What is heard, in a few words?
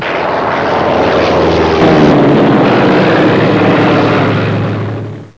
aircraft, camel; warbirds